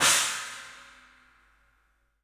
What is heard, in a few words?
CYMBALS; 3D Sound; 3D Drums